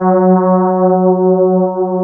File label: CMI ORGAN